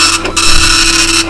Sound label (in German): nadeldr; akustik